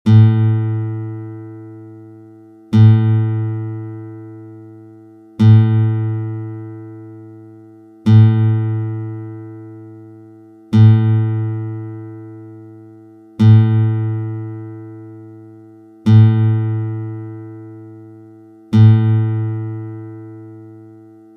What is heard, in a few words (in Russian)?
struna Zvuki strun klassicheskoj gitary